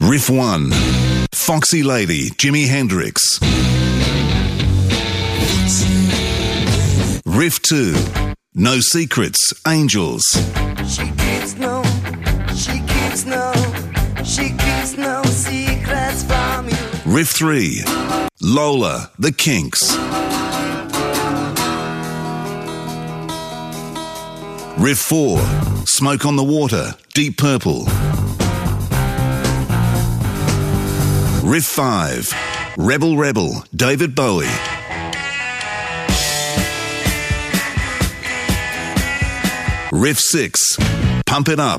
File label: classic riffs